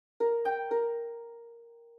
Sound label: Harp